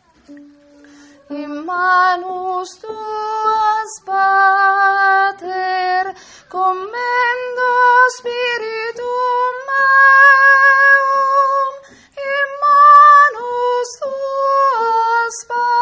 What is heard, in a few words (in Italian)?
soprani